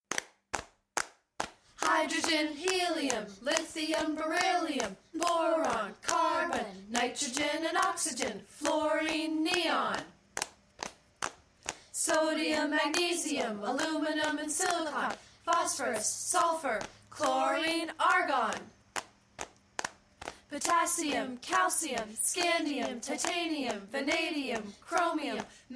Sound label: Periodic Jumprope Rhyme